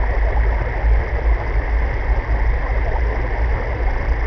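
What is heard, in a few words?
ambience submarine loop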